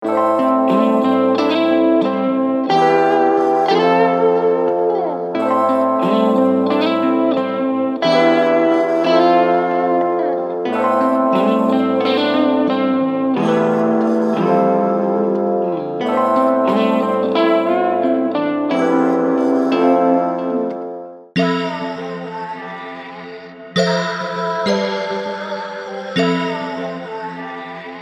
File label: Reggaeton; Reggaeton Redemption Volume 1; Highline Audio